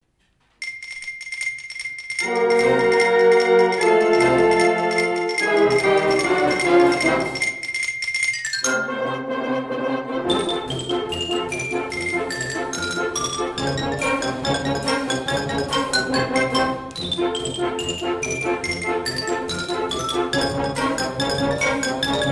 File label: galop